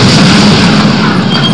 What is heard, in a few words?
120MM; That - For Windows 95; UETANK